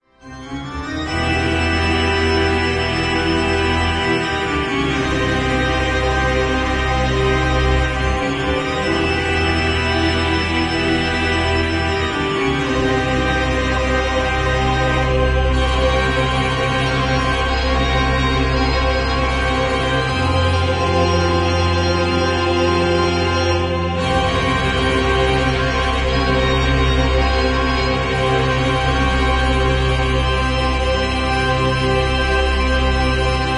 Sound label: instrumental